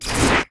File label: Ball Warp In; gamerip; disney star smash; android